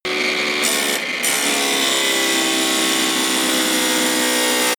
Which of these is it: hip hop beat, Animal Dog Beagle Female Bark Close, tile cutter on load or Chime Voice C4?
tile cutter on load